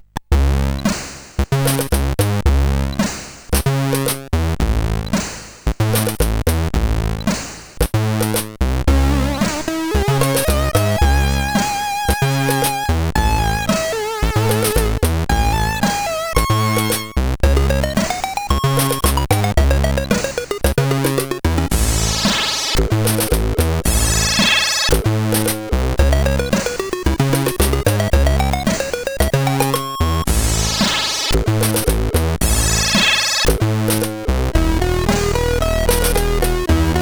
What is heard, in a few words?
CSG8580R5; hvsc